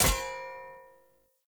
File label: Short Sword Medium Impact Metal